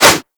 Whoosh; Furax; Weapons

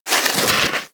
Armadillo Roll Snow

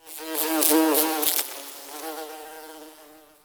insect bumble bee; Ambience; Warframe; Eidolon Plains

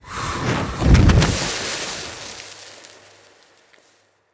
Blaze Armour Activate Cast; Powers; Fenn

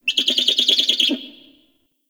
Owl Critters Perched Ground; Ambience; Warframe; Duviri

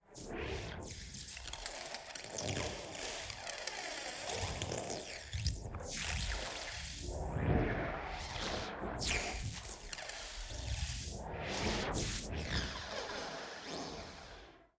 Fight Intro SFX; Cinematics; Ballas

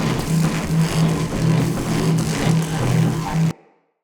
Ruins Machine Loop Damaged; Props

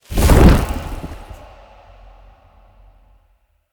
Fire Push Throw; Powers; Fenn

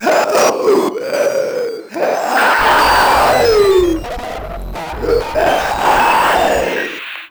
Proto Infested Runner Idle, Vocals; Warframe

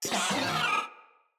Moa Vocal Pain

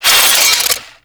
Drone Blade Jump; Warframe

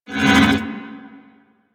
Void Portal Rotate One Off

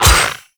Melee Hit; Warframe; Voruna

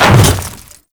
Hammer Boss Land Ice, Footsteps; Warframe; Grineer Hammer Boss

